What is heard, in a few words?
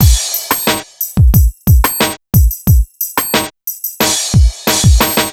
Hip Hop Beat, Drums; Digidesign; Pro Tools; Pro Tools Essential Loops; Drumbeats